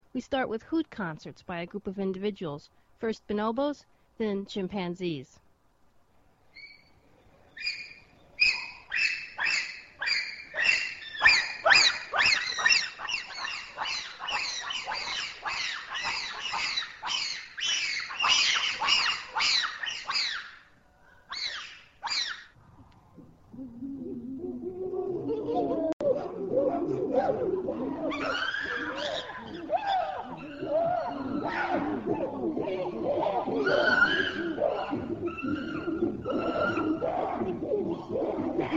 Chorusing Bonobos & Chimps

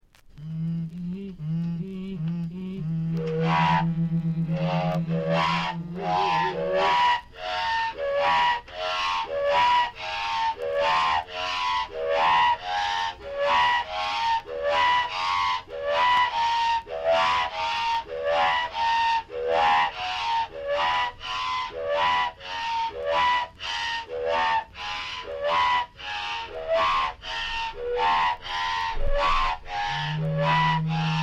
Iawa Flutes; Mayantu

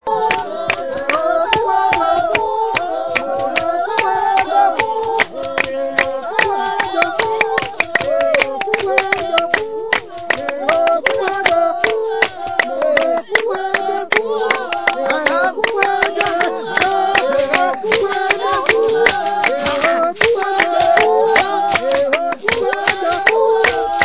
Juhoansi Bushmen Dobe; Mongongo

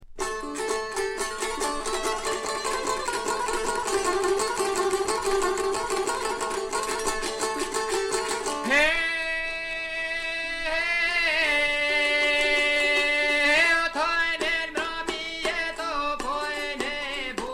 Ballad, Albania

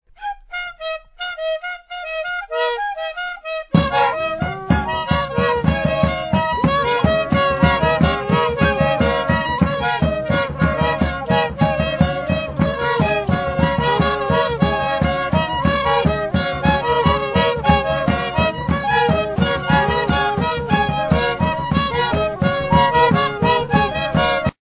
Moufou Wind Ensemble Mandara Mountains Cameroon

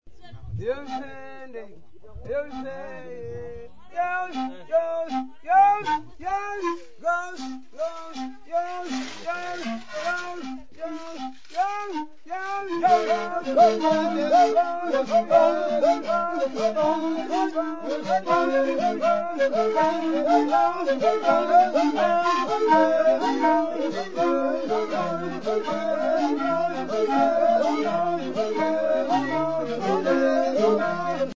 Nyanga, panpipes & voices; Cancune Mozambique

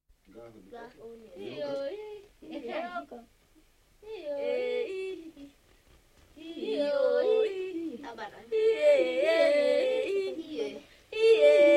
Dorze Maskal Song

